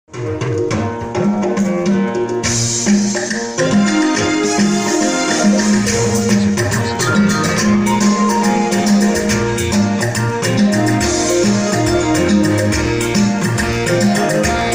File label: raw audio recording in the studio